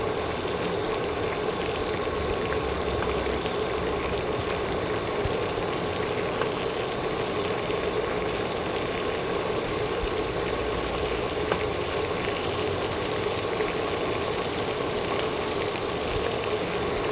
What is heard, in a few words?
boiling soup